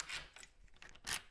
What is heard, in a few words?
houses door lock